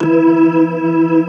E3, Keyboards Old School; USB Soundscan vol.09; AKAI; BIG HAMM

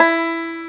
BANJO E4, ETHNIC; AKAI S-Series CD-ROM Sound Library VOL-8

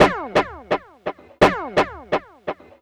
Guitar, Trip-Hop Loops; Sonic Foundry; Rondo Brothers

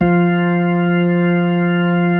B-3, Keyboards

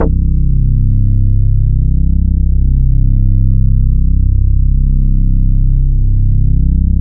XL BASS, Bass Analog & Synth, DISCO; USB Soundscan vol.12; AKAI

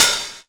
CLUB OHH, CLUB KIT; Wizoo - Platinum 24 Electronic

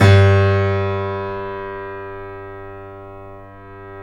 KEY, Steinway ff

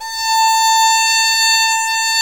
SOLO VIOLIN; VL TEN A 4; AKAI S-Series CD-ROM Sound Library VOL-7

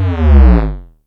JNG BASS, Yamaha DTXpress

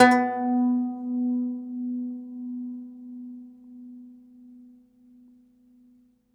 DOMRA, Plucked Strings, Segundo Domra

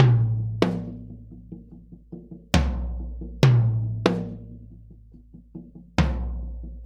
Earth Loop, Drum Tools, Laid Back

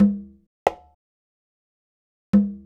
Conga, Latin Percussion

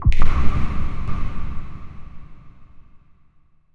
DSP Hit

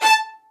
XLD2 A5, LOUD VIOLINS; Q Up Arts; Denny Jaeger Master Violin Library

